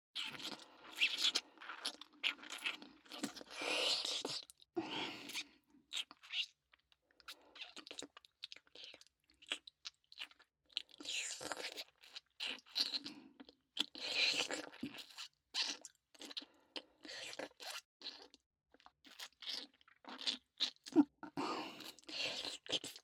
乳首舐め左; ループ素材

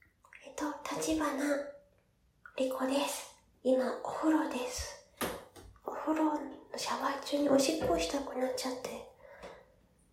おしっこ 実演 お風呂 で 立った まま おしっこ; お風呂で立ったままおしっこ編

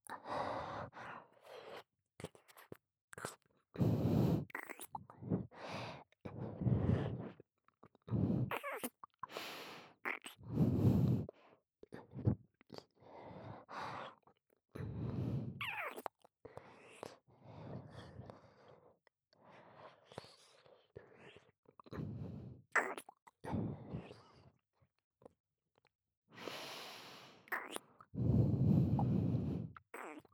左耳舐め, ASMR; アドリブ集

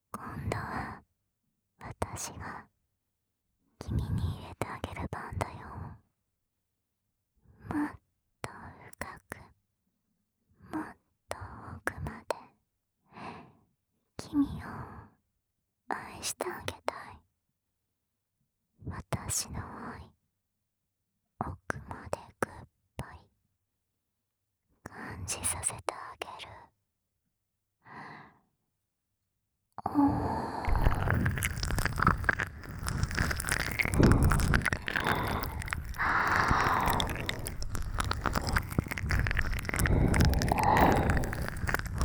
両耳舐め, ASMR

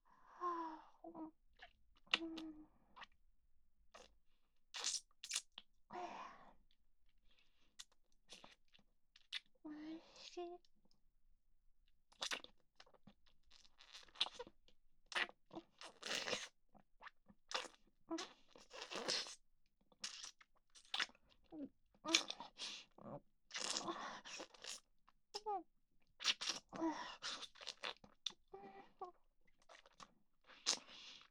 フェラチオ; SEあり

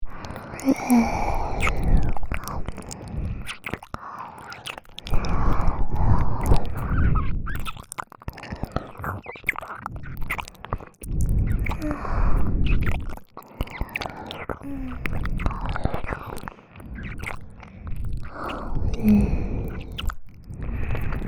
耳舐め A (両耳)

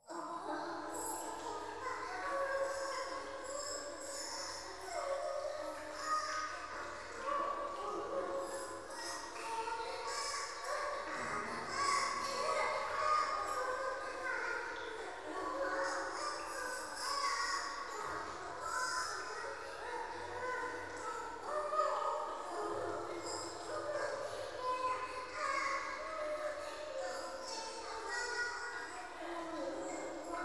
教会 低音喘ぎ 雨上がり

